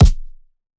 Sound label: Punching Perc, Straight Glove; 99Sounds; Punching Percussion